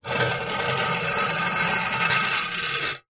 industrial shovel spade metal drag scrape concrete; zapsplat